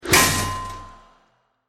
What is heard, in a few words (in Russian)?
zvuki volshebnoi fei